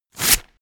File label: tape rewind